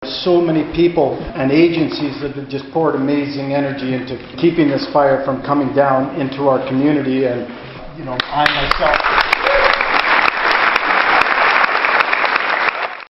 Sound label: allplause